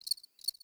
Insect Day; Ambience; One Offs; Warframe; Eidolon Plains; Eidolon Landscape

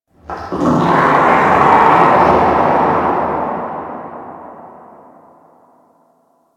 Infested Distant Creature Snarl, Ambience; Warframe; Corpus Infested

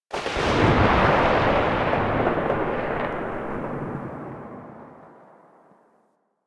Sniper Tail; DE; Warframe; Warframes; Frumentarius; ExaltedSniper

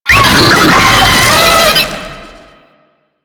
Brachiolyst Attack, Vocals; Enemies; Warframe; Proto Infested

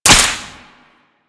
Whip Impact Default, weapons; Grn Whip

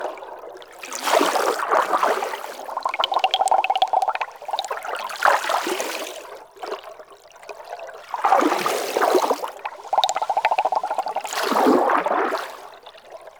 Spear Fishing Fish Swim Loop; Warframe; Weapons; Eidolon Fishing Spears

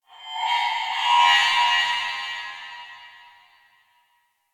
Spirit Chatter, Ambience

